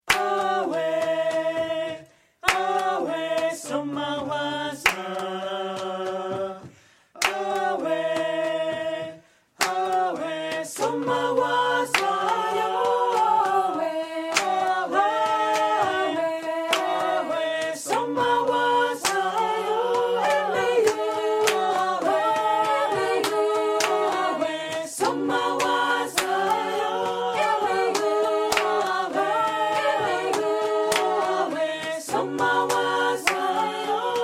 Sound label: choir; Somagwaza